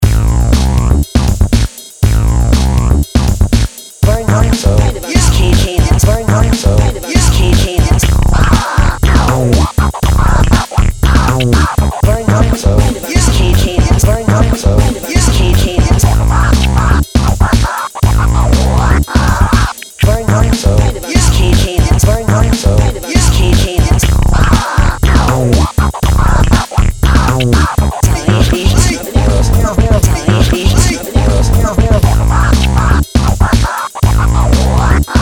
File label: my funk